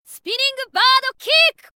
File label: spinning bird kick; games; ringtones